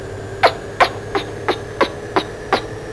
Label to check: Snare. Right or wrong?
Wrong